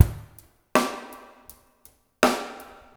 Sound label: WETDR1 R, Liquid Grooves; Spectrasonics; Liquid Grooves 1 & 2; RESOSOUL